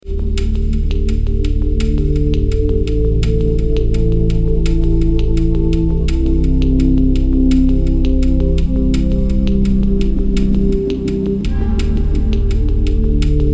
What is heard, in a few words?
GM loops